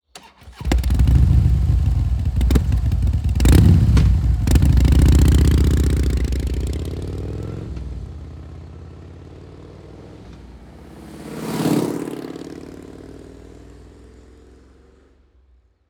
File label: softail v strike open